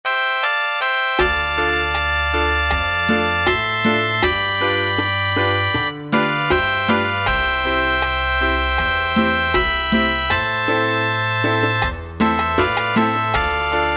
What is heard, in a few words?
midi